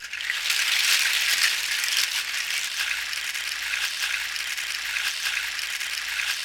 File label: Equant nutshells Loop, shakers